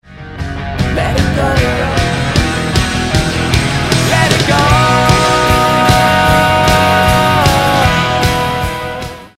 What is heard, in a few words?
tone